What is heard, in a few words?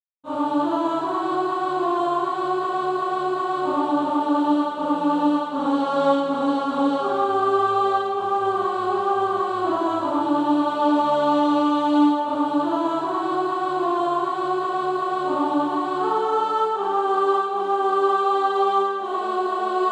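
alto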